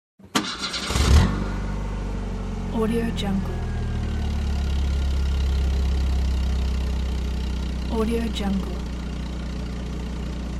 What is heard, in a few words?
the car starts and leaves quickly